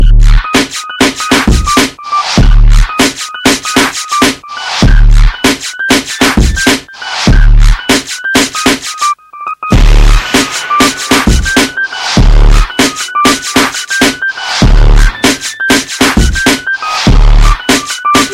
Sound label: Drums Bongo